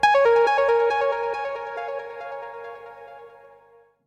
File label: Notification